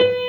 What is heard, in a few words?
jazz B4 Quarter note